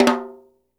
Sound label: FLAM, SNARES; Sampleheads - New York City Drumworks VOL-1; SW SNARES